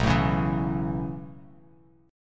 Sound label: B 7th Suspended 2nd Suspended 4th down Bass Standard, chord; B7sus2sus4; strummed; JGuitar